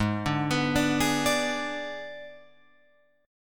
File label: G sharp Diminished G sharp, Guitar Open F; G#dim arpeggiated; JGuitar